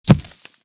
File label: bullet impact; Titanfall 2